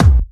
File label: Bassdrums Clubby Kicks; Vengeance Samples